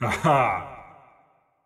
Laugh; Marv

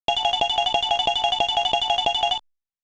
Bonus Round - Rotation; Gaplus

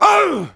Aaa Hurt; Submarine; Neighbours From Hell Console Edition; GC, Xbox

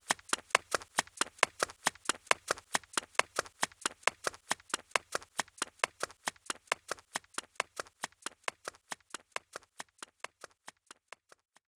Foot Woman Loop

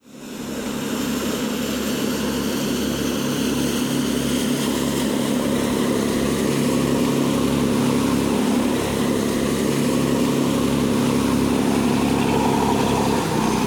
Miami Motorboat

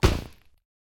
Task Complete Tiny

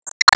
Enemy Wood Poisonball Throw; gamerip

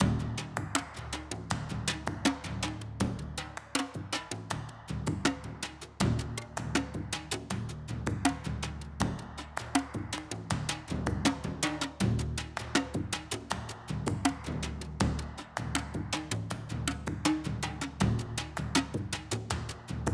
Drums 160

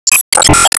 Enemy Poisonwizard Mirror Crash; disney star smash android ios mobile gamerip 2020

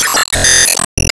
Enemy Temple Shield Break; Disney Star Smash; Android, iOS, Mobile

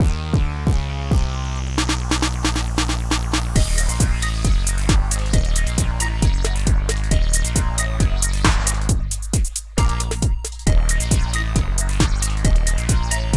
Miniboss; Born of Bread

